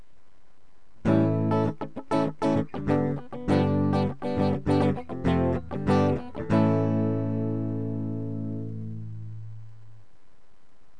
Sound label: heavier electric